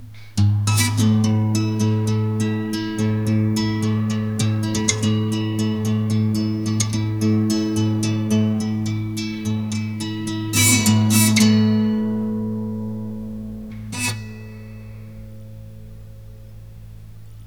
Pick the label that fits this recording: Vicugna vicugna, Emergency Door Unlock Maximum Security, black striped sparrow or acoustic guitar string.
acoustic guitar string